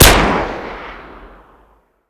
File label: mp5; Modern Warfare